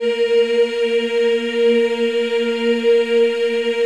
A#3 HI, Choir; USB Soundscan vol.28; Acoustic & Synth; AKAI

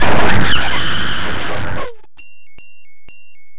disto fx, big beat; Big Beat Samples & More; AKAI; DISTOFX KIT

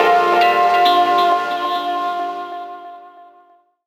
PAD GTR FX; Rawcutz; Nu Forms

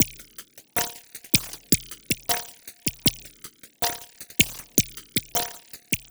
PARTICLE BEAT

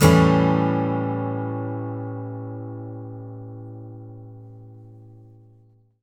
ACCGTR 7TH; Country

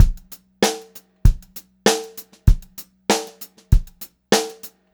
FUNKY BEAT, Funk-Groove Drumloops; USB Soundscan vol.38; AKAI

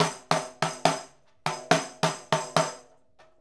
Tambourine

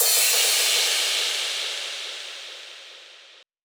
drum Loop 140BPM Crash

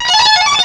GLOOP; East West - Global Sounds

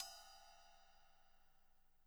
splash, splashes

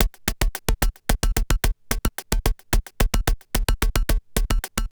Industrial Beats

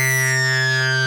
SYN, 150 BPM, Drum & Bass; Ueberschall; Drum & Bass Flash Trackz

